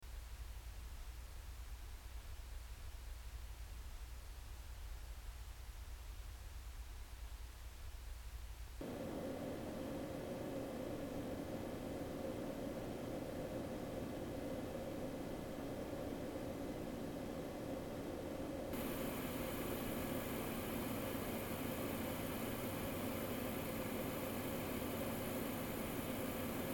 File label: silverstone rv03 4870 idle; top bottom 9v 9vlow 12v